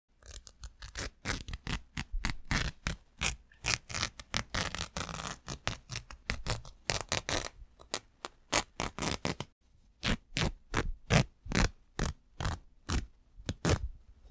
Cloth Tearing And Ripping Flannel Cotton Scraps Close Mic Long Slow Staccato